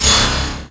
FX Impact; Vengeance Samples

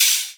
Open Hihat; Vengeance Samples; VEE Cymbals

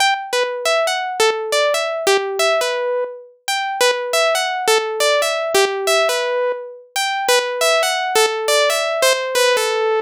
Pluck 138BPM DRY, E minor; Bassline & Melody; Vengeance Samples; Freefall Kit

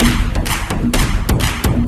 VFX2 Loop 128 BPM

